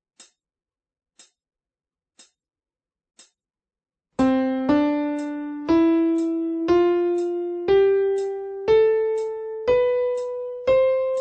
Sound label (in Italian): Sincope es b